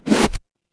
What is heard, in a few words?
paper fabric zipper